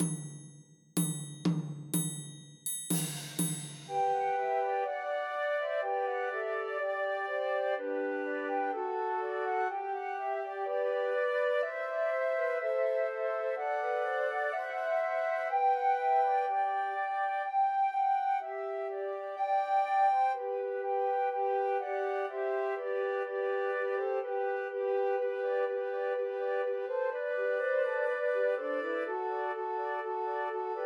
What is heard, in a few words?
Trios for Three Flutes; Joy to the World; Christmas